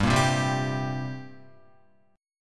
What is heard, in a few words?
G-Minor Double Flat 5th G down Guitar; strummed; JGuitar